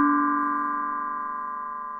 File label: ding medium; tea time